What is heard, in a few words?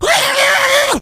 rage at victim, infected, male